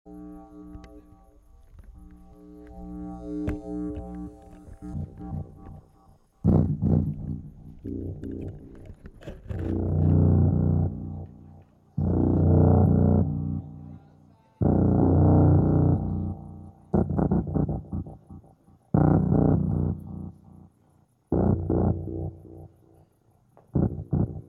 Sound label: noise; magia do caos